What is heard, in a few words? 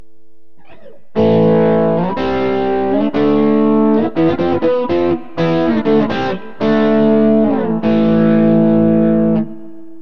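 evolution bridge, guitar pickup; burny; fugly clips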